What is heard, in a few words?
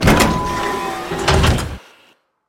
elevator open; elevator music